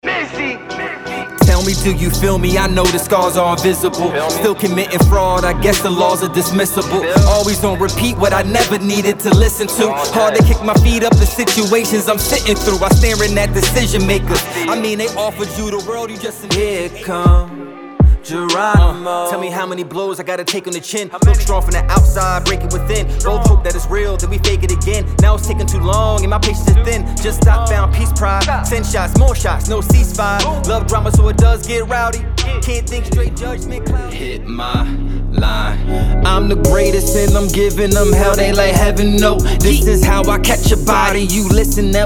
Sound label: MEDIUM SONGS BLEND